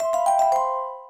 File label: music box, notifications; ZTE; Blade-Spark-Z971